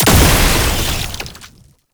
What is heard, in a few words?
Stomp Web Explosion; Boss; Enemies; Abilities; Warframe; Oraxia